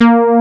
MOOG A#2, Moog Synths; E-MU Formula 4000 Series Vol. 3; Analog Odyssey

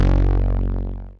505, String Machines

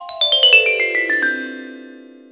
Glock; Orch Perc and Stings; cartoon; musicradar; cartoon caper

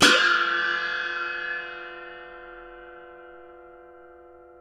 NEPAL WAH, Asian Cymbals

